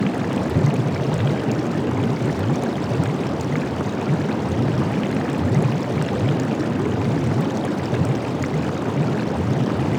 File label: Alchemy Lab Bubble Loop; Warframe; Hub; Liset